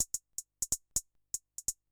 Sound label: HIHAT HOUSE 125; eJay; Drum